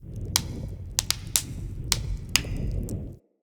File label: Smithy Forge Fire Crackle; Soul Plane